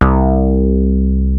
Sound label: OSCAR BASS, OLD SYNTHS; Rubber Chicken Software Sample Bank